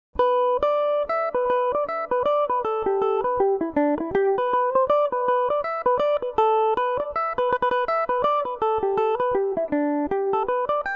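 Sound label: G major revelation, Irish mandolin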